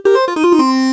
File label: funky; PlatinumPhattCD1; E-MU Producer Series Vol. 8 – Platinum Phatt; Best of Phatt